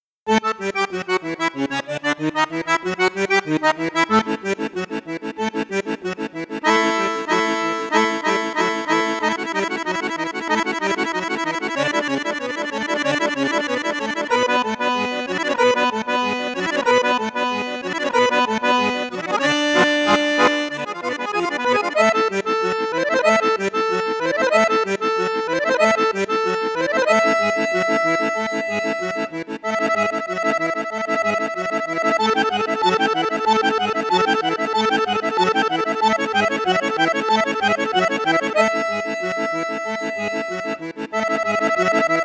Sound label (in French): registre basson flute boite flute piccolo, accordéon; maugein resonance iv